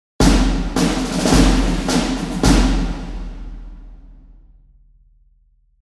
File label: Big Cinematic snare drum